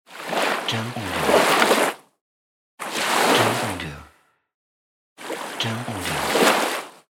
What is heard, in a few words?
Sea Wave Short